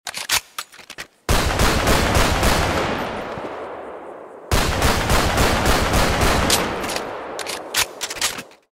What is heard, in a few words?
Glock 17; artemis defence